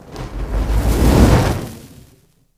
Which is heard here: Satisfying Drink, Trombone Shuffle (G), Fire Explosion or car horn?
Fire Explosion